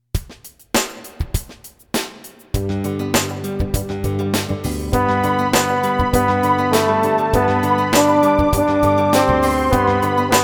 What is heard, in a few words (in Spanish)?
Vagas triste y angustiado, Himnos Cristianos